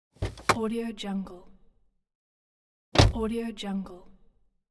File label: car door